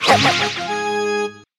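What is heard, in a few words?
Punter attack; Fight Knight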